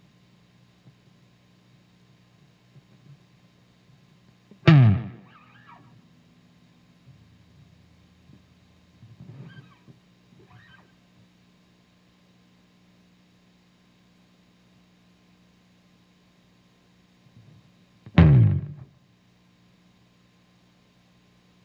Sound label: bass slide